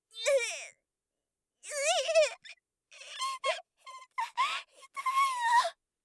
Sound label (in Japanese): ねじる; 効果音なし